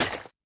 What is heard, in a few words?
Obstacle Instrument; hello kitty friends match; android